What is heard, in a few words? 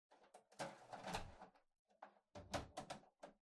Air Conditioner Far Filter Insert